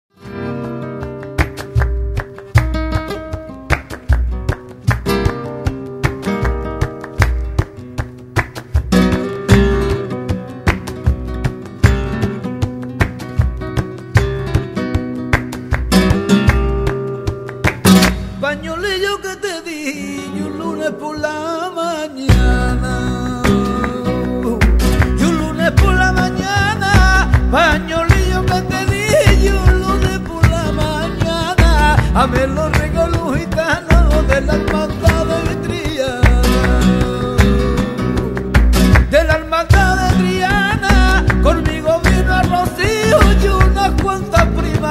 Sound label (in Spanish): Canto